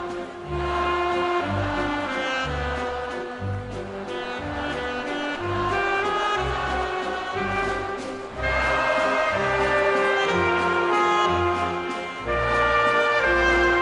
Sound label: waltz